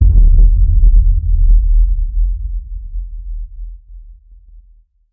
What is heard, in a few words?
Hit Low; Hit Low Mission